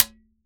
Drums Percussion Congas

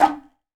KOTSUZU, tuzumi; AKAI S-Series CD-ROM Sound Library VOL-5

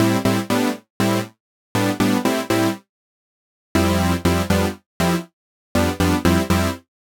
Chord Synth Am, 120bpm; Zero-G; Extra Chord Progs

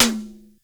SYNC TOM, TECHNO; Crypton - DrumBase5000; TECHNO #2

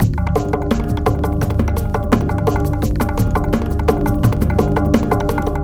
FAT FRAME FRACTAL GROOVE; Sonic Foundry (Sony Creative Software); Vir Unis Infusion

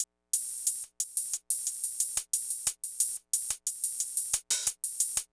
Hi Hat

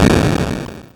snr; Mono

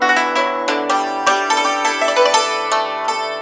YANG CHING, Heart of Asia; Spectrasonics

